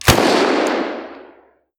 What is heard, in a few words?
Firearm AR15 Shot; Firearms; Zomboid